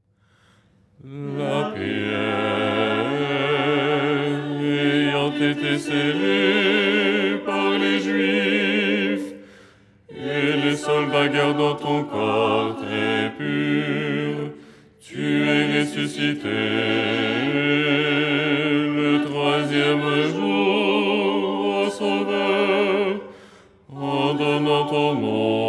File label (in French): basse